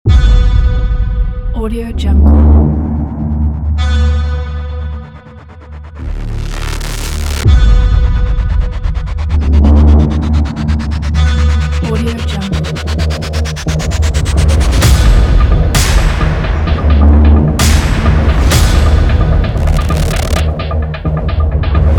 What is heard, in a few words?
powerful trailer; zbsbrothers